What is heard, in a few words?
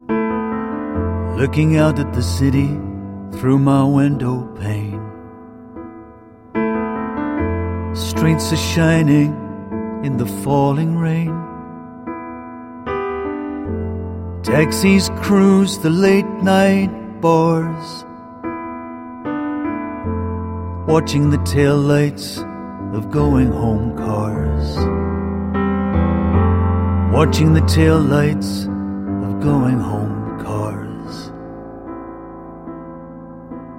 Chimes